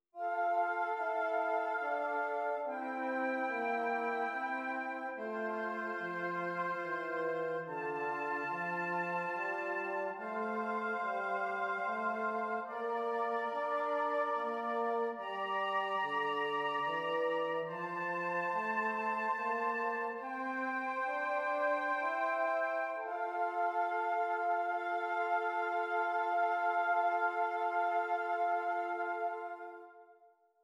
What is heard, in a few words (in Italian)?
Esercizi di contrappunto a tre voci; Zweite Gattung; Nottebohm pagina 51